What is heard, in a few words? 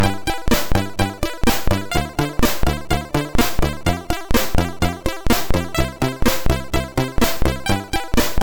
Sample Music, MOS6581R3; hvsc